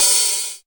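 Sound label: hihat open exciter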